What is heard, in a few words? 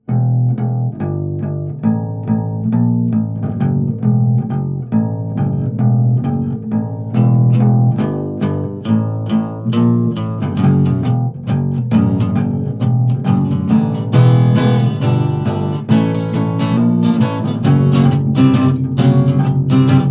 Intro Acoustic; The Escort Mission Podcast OST